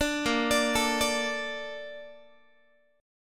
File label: A sharp Major 7th, Guitar; arpeggiated